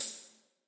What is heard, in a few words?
tamborine, instrument; minecraft; wynnpack